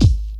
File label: 00s bumpin kickdrum sample g sharp key; Duration 0:01; Samplerate 44100 Hz